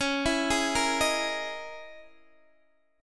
Db Minor 6th Add 9th Db down Guitar Open D

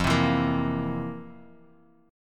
F Major 7th Suspended 4th, Guitar, Open G; 3,5,2,3,x,x; down; chord shape

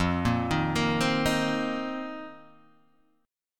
F 7th Suspended 4th F down Guitar Open G

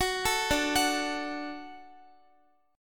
D Major D down Guitar; arpeggiated; tuning 4E,4A,5D,5G,5C,6F

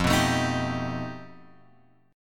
F 9th Flat 5th F; F9b5 Chord {1 2 X 2 4 3} strummed

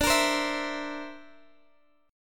D 7th Suspended 2nd Sharp 5th, Guitar DADGAD

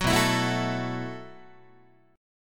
B 7th Suspended 4th B down Guitar

